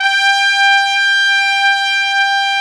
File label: TRUMPS, HORNS